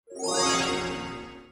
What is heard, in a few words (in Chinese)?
得宝箱物品